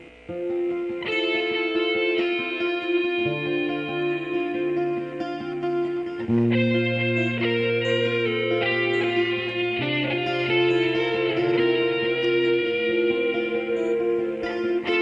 sad song

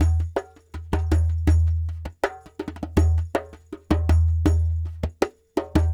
Lg Dumbek

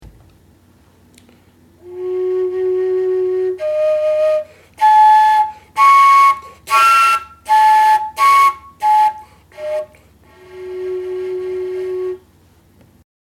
koncovka closed voicing

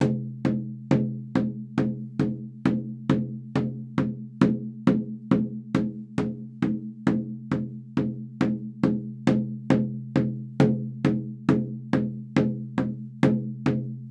Big drum; Sneak Up (Gittantmeah)

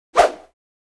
swish single; Asian Show Sound Effects

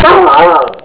ckeilah barks

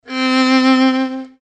deep violin

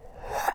shoick

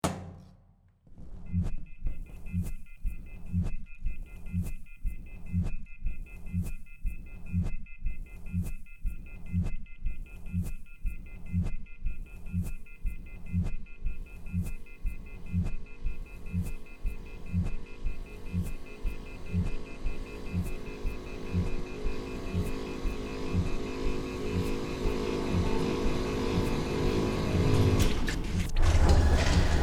elevator music

